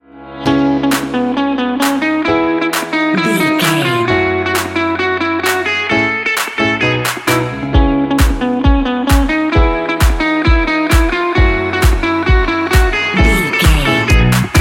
summer hit

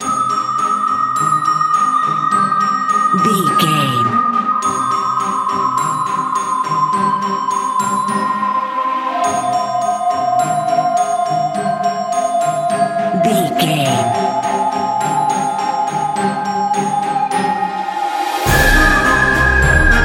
little magic

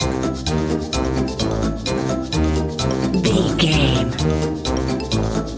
wacky inventor loop

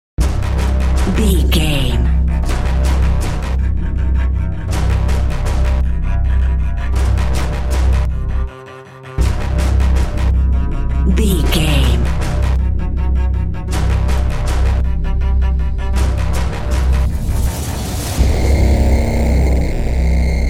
creepy horror suspense

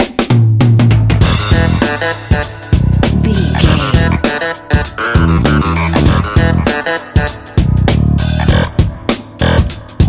funky energetic intro

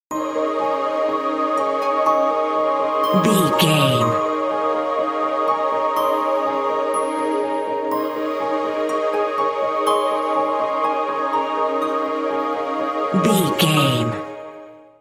enchanted magical forest

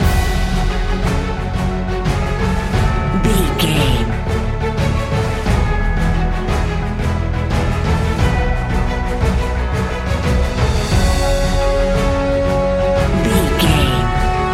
epic cinematic loop

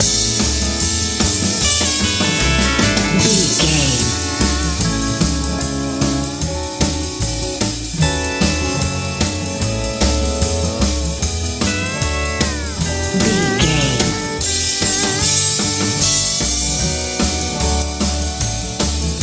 sunny warm texas blues

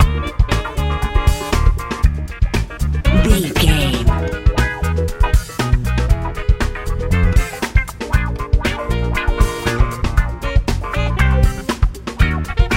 bright warm cool funk

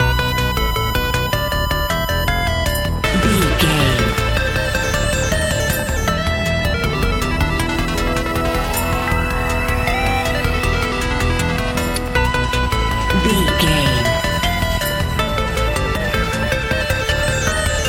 epic video game adventure loop

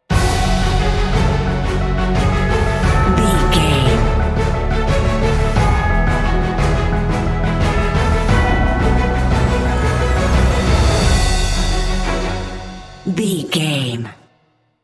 epic cinematic

